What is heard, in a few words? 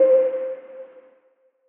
PLUCK (C); Water; Cymatics; Orchid Sample Pack